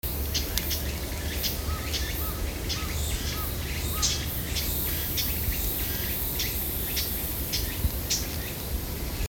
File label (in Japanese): uguisu C3